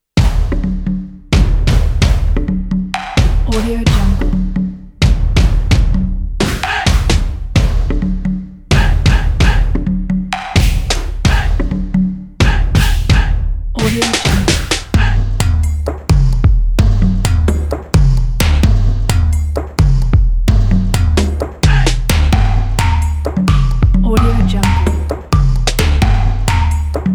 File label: fashion stylish percussion